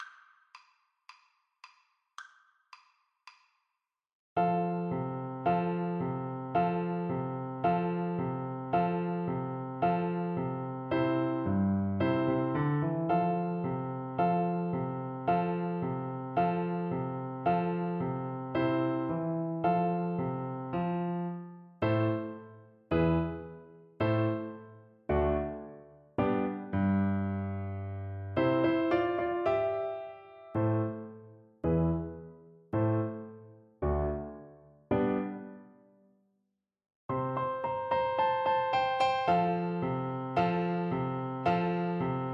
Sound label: baltimore belle, oboe